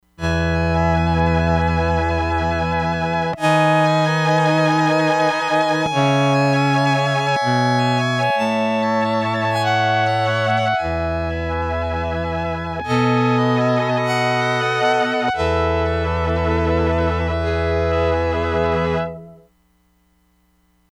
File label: SOLO TO ENSEMBLE STRINGS, Crumar Bit 99; Factory Programs